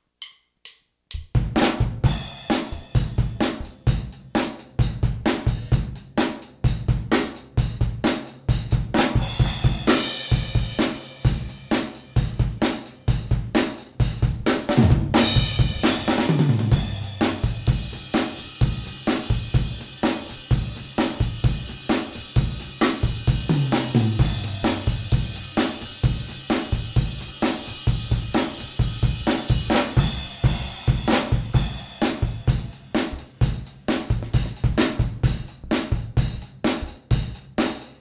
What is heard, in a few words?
Rock, 130 BPM, Some basic 4/4 rock-style grooves.; drum